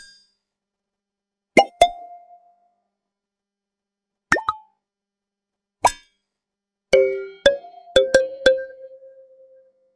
light and positive alert, Friendly notification pop, rounded attack, light and positive; 0.3s; Социальные ролики, презентации, сторис